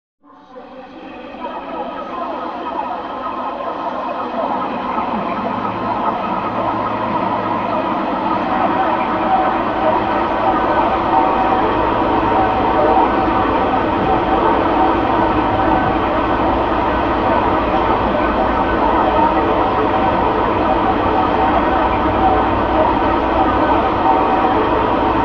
Gurgling Water

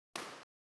IR; CFA

